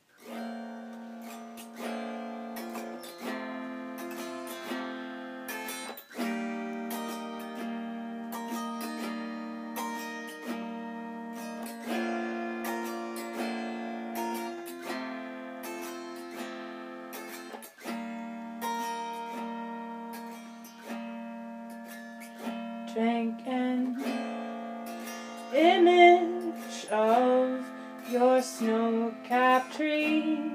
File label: singing, autoharp; original song; The Jasper Waltz